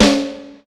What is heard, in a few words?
strong bottom end steel snare drum sample c sharp key